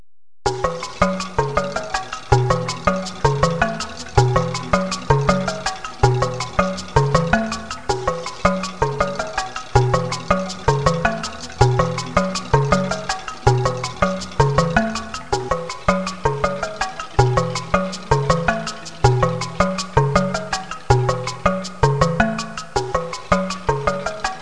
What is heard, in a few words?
reverse vibe; ZOO Guides - Mammals of Africa